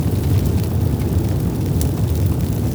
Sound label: fire big; emitters global